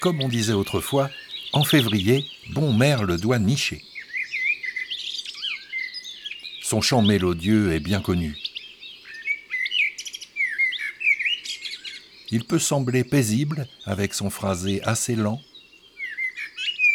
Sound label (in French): Fevrier Le Merle noir